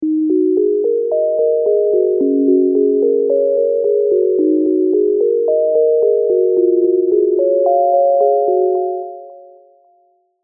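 E flat minor 100 bpm, Ambient & Downtempo MIDI Melody; Method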